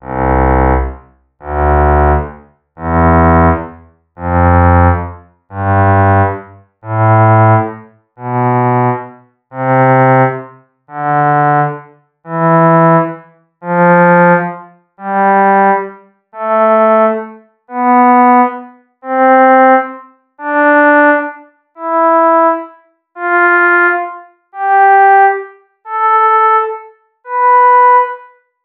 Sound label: cello